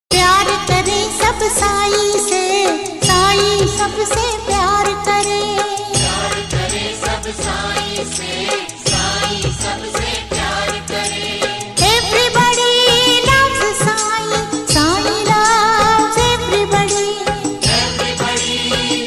english, ringtone; saibaba

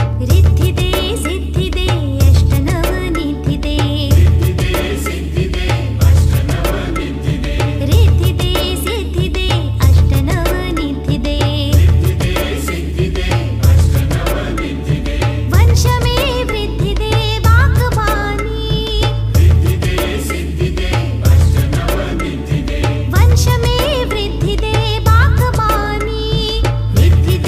Ambe Maa Aarti, Gujarati Devotional

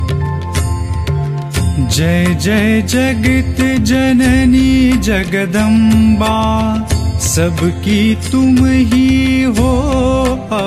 Shri Lakshmi Chalisa, Lakshmi Mantra

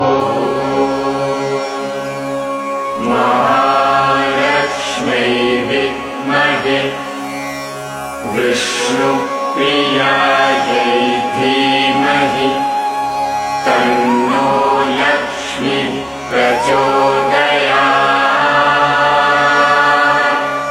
Laxmi Gayatri

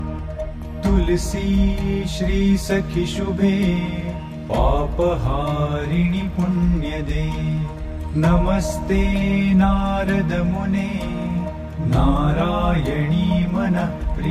Tulsi Mantra, Tulsi Pooja Mantra

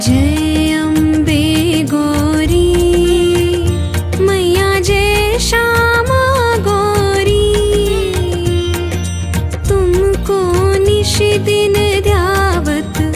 Durga Aarti

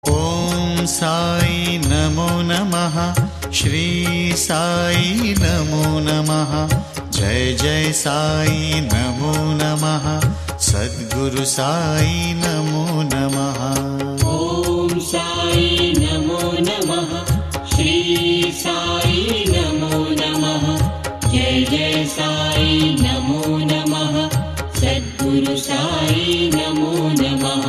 sai mantra ringtone; saibaba

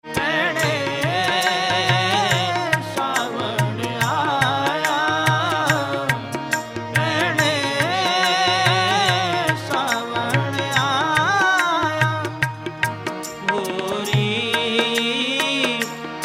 Sikh Ringtone

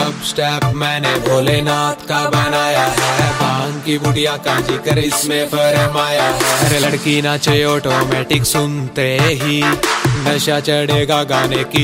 Dubstep

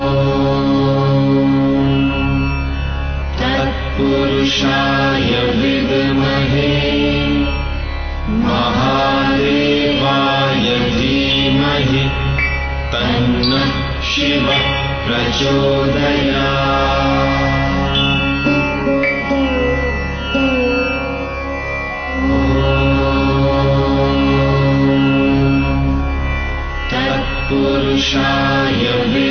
Shiv Gayatri

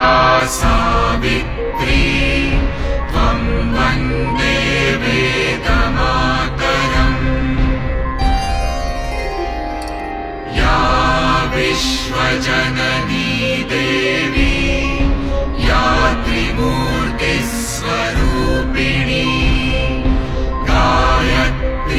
Shri Gayatri Devi Stotra & Gayatri Dhyaan

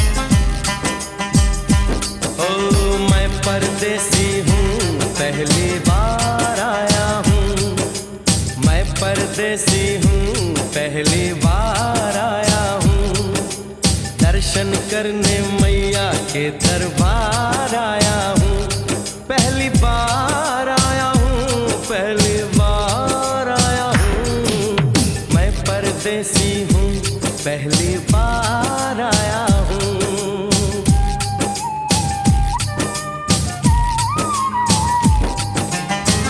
Vaishno Devi Bhajan

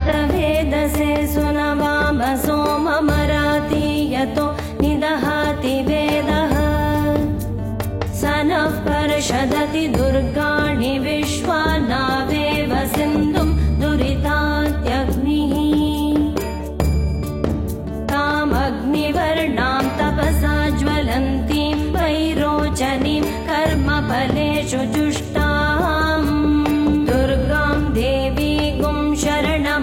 Durga Suktam Durga Gayatri

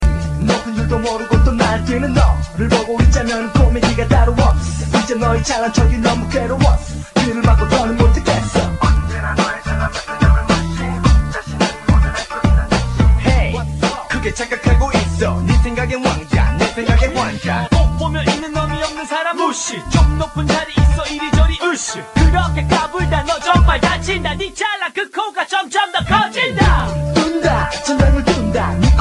english hare ram, krishna ringtone

